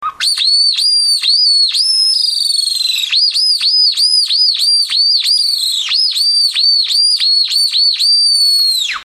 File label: skylark call